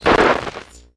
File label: command, Radio